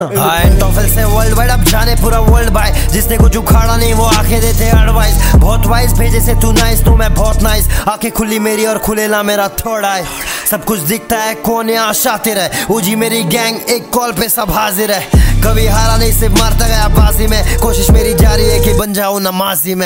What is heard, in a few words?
roots hip hop